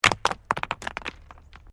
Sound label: STONES MOVE